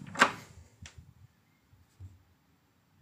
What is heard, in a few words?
Open